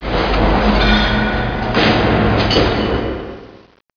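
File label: Hangar Ambience; XWing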